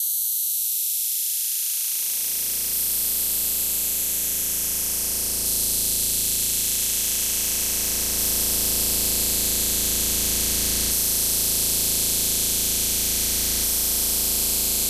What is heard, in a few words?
Metasynth